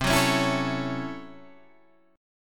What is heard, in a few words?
A 6th C, A6/C chord; strummed